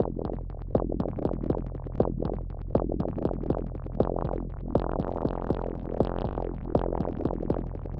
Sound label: eurorack